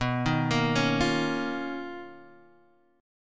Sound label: B Major Sharp 11th